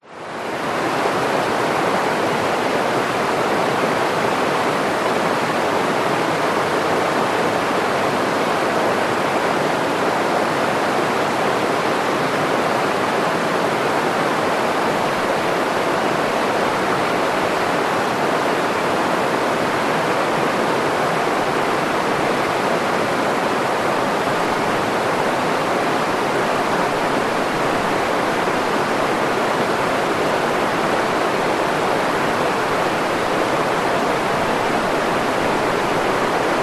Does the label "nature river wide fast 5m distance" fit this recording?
Yes